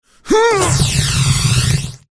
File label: mage male buff